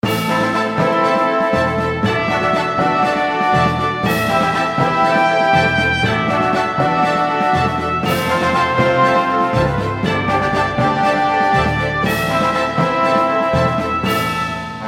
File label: theme victory